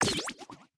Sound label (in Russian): Общий звук срабатывания абилки карты